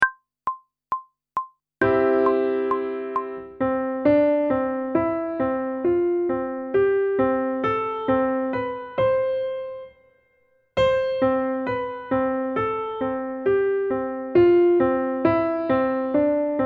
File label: Intervals of a Major Scale one octave ascending and descending, pop vocals; grade 7; Technical Work